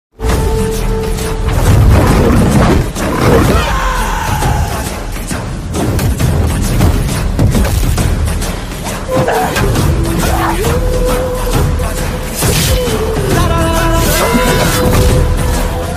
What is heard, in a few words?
trailer ringtone; Chiyaan Vikram; K E Gnanavelraja; Pa Ranjith